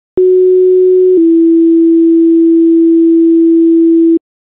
admin, tones; elk

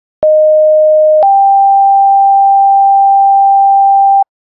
siren; clinton

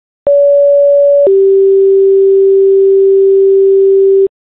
siren, tones

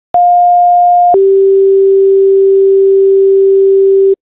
siren, tones

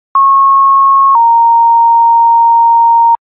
fire, tones

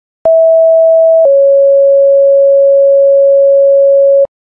ems, tones

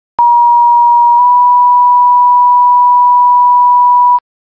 siren, fire; pfd

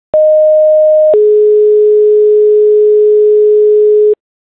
siren; clinton

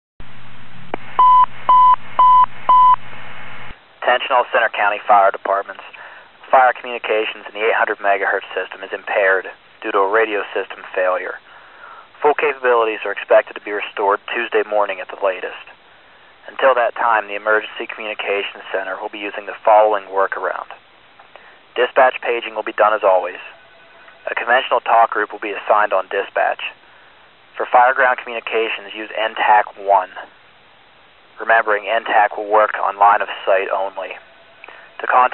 radio system failure

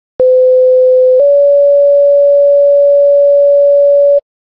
page, tones

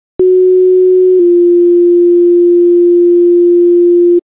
siren, tones; elk

